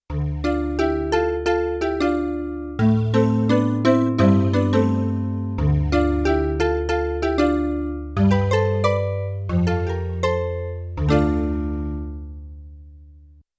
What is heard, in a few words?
Gitarimba-Calliope, Yamaha TX16W; Combo Performance 2